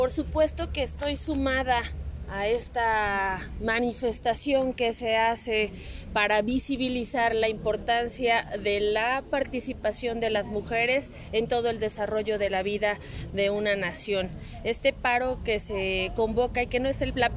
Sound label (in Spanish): Marcha